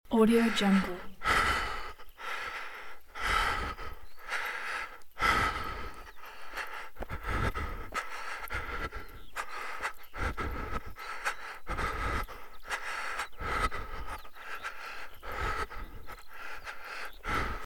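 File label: tired male panting on field way loop; human sounds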